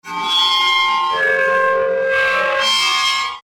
destructive metal scrapes

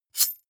knife slash

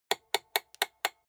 rotary control slow turn hard

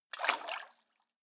step water sneak in

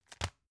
tissue package drop soft

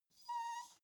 dog whimper

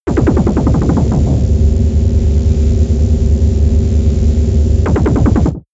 space alien engineroom calm working

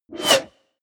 wipe

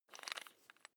electric drill bit unfasten wrench turn

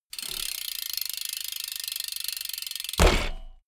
bmx bike ramp fly land

